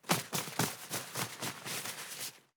Umbrella, Compact, Movement, Fabric, Cleaning, Shake Out; Sergey Eybog; Automatic Umbrellas